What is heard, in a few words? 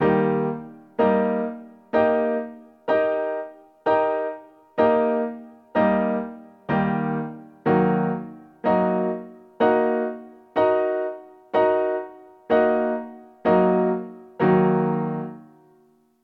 triad and inversion block chord in E flat and E flat m